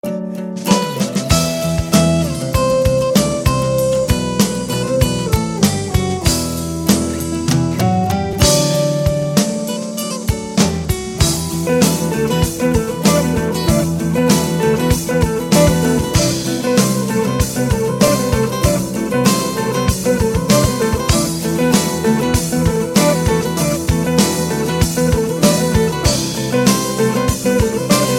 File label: Guitar Vibes